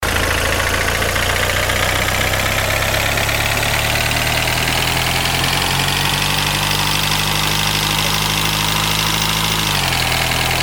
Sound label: driving diesel car engine looping